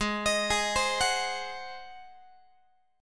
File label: Ab 7th Ab Guitar 4A,4E,5A,5D,5G sharp,6C sharp; Ab7 arpeggiated; x,16,18,18,16,17